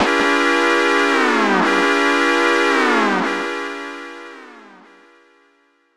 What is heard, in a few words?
Alert; Blazeon; SNES